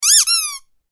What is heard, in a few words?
squeaky toy sound effect